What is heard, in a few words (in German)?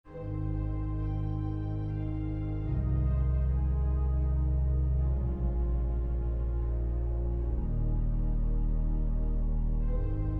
streicher schwebung, orgel